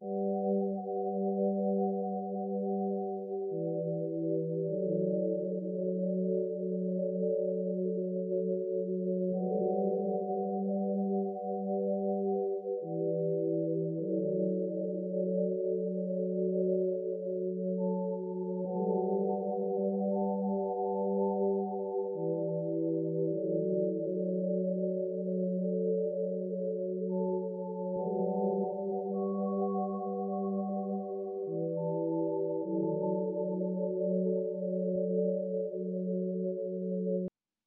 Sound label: 103 BPM D Maj Pad; Melody; Loop Stems; Cymatics; 2022 Melody Collection Platinum Expansion; Needed You